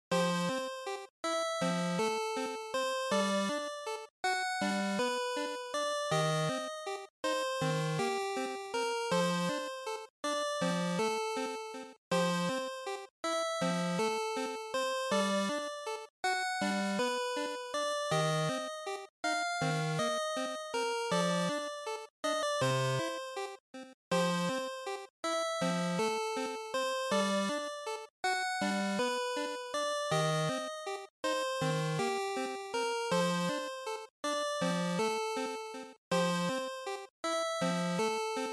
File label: GB RPG Dungeon3